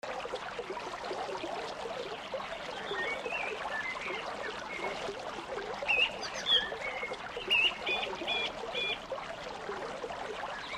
water mountain brook and birds

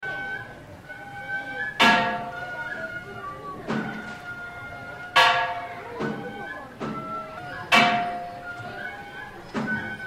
asia matsuri

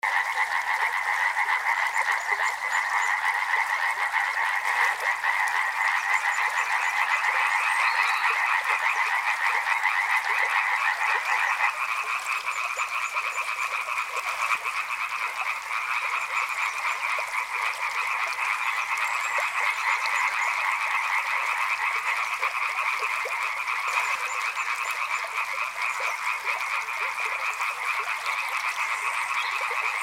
atmo frogs chorus and stream

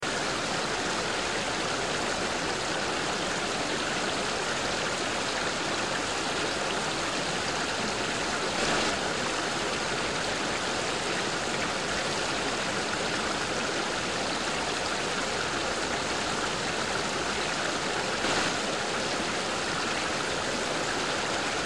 water fast mountain stream

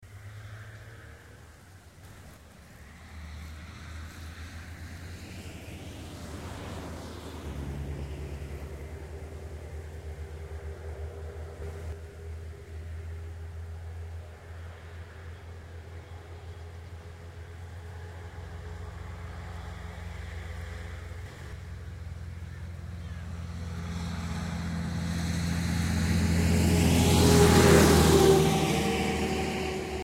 traffic country roadside

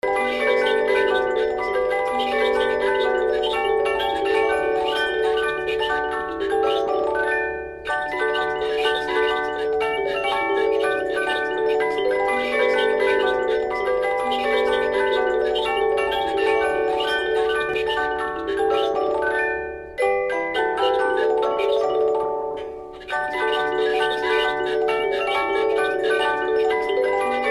atmo holidays antique music box melody

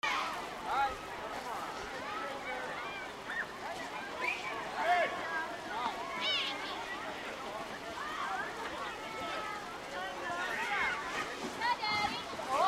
holidays sledding crowd

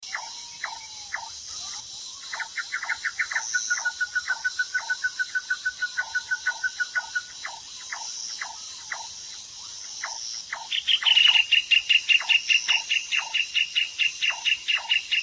forests close day birds

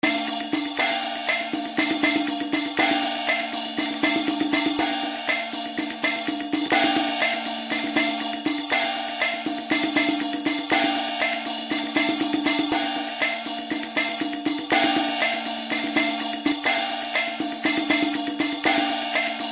atmo asia instruments

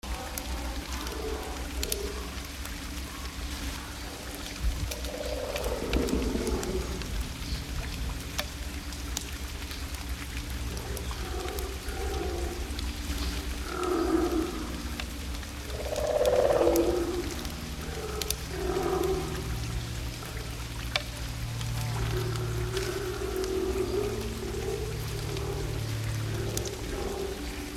forests rainforest mix

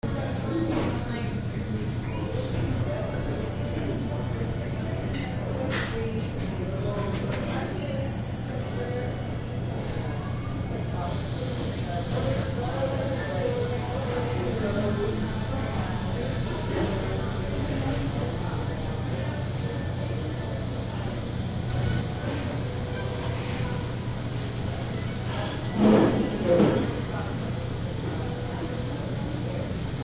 atmo san jose restaurant crowd

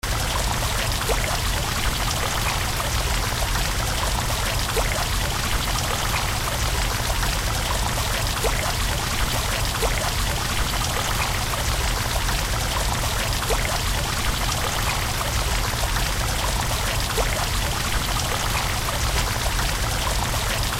atmo mission fountain; ca sjc

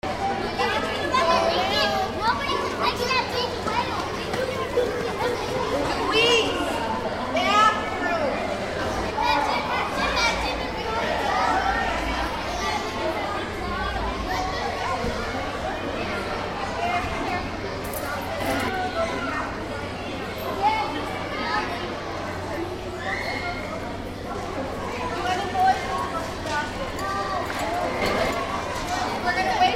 aquarium crowd kids groups

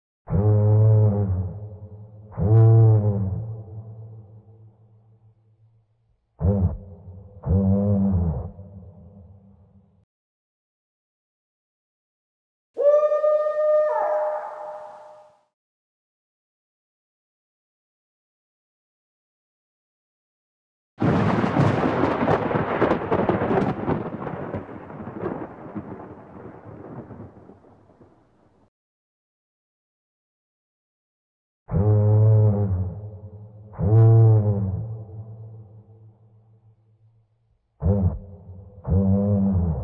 dark spooky set

